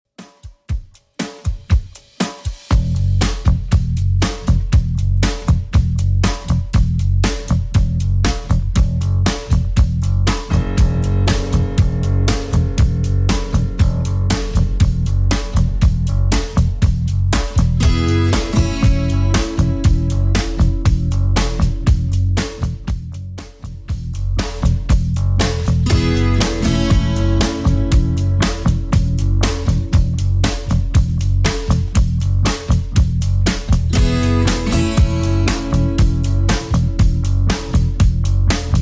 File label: 80s Redrum 119bpm